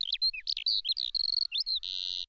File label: ambient; World of Tanks